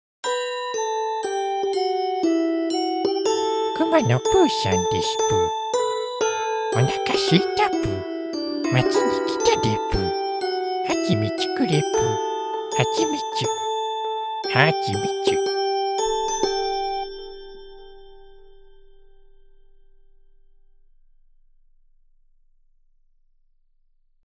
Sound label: chime